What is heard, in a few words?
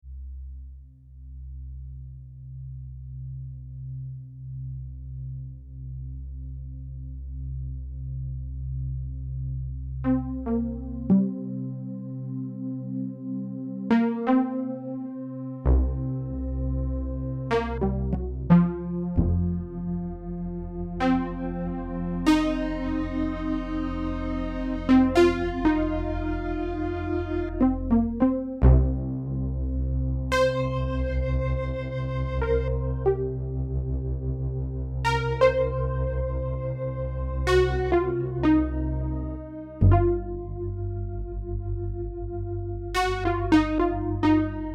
PWM